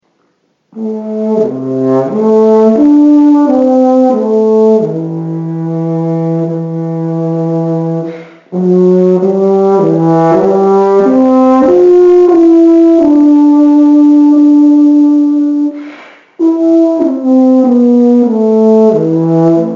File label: Expressive Sound Example